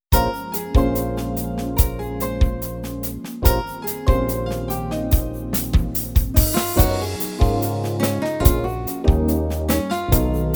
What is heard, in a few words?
Tango Flamenco